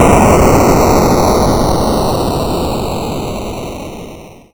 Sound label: rocket Launch; The Adventures Of ASTROMAN; Ludum Dare 19